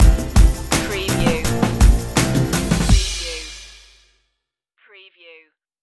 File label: spring steppin stinger